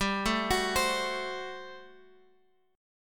G Major 7th Suspended 2nd Suspended 4th, Guitar, Open F; GM7sus2sus4 arpeggiated; 19,16,18,19,x,x